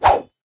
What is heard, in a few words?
sword attack; matmos interactions